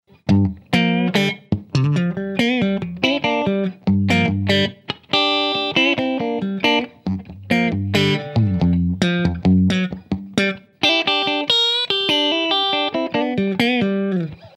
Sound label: Gibson Les Paul Standard Bridge neck clean